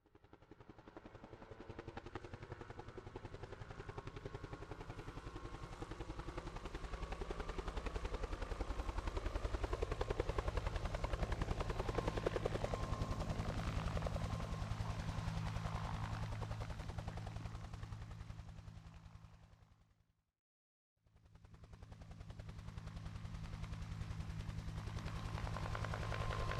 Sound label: helicopter; ambience; AssaultCube